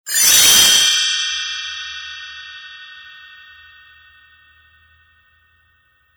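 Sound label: Fanfares, singing cue, instrumental; Rapunzel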